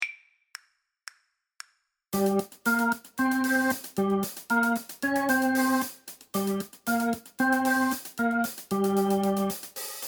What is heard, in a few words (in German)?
backing track; musikdurchblick